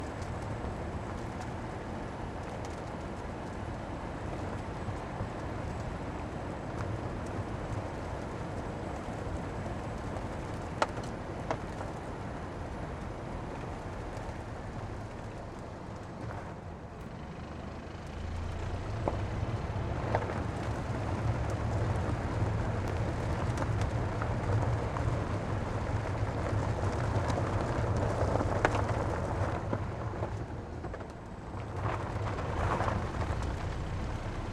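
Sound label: Vehicle Tire Car Driving Tires, Seat Ibiza; Seat Ibiza 2019; Systematic Sound; Mini Themes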